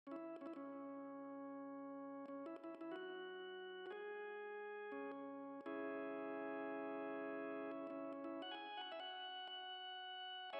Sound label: Csharp Minor Organ Organ